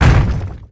boulder impact stone

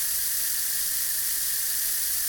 Gas Leak Loop; jasem just another shooter with electronic music windows 2017

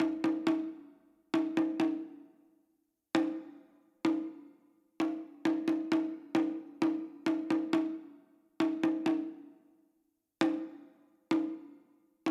Drums; For Honor

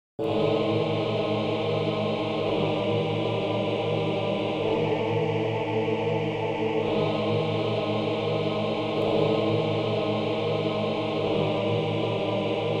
music castle; Dangeon Quest; android game music

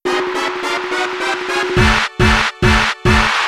Sound Bomber - Clear; Mario Artist; Polygon Studio; N64

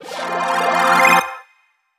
RECOVER

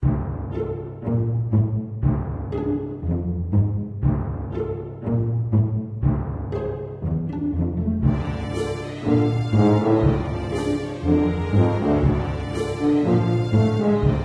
Underground Route (Quest-Action); Medieval Mercs

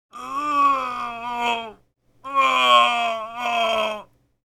Grunt

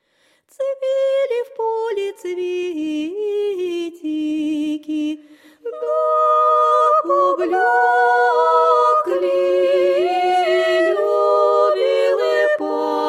Town Chant; Mount & Blade With Fire & Sword

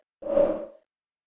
Page Right; Xbox 360 dashboard

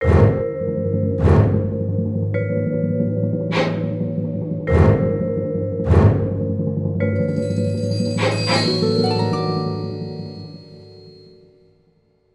Sitar

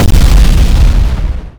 Meteor Hit

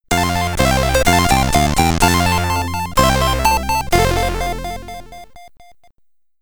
Stage Clear! (SID Stereo), commodore 64

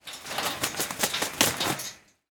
bird in cage shake wings rattle

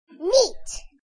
exclaim; Chibi Knight

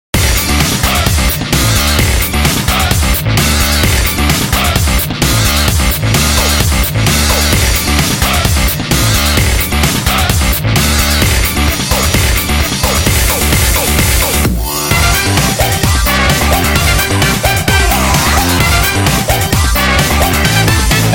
BEAT; BUSTER BEAT

